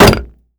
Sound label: Bullet hit metal; DoomRTX; impact metal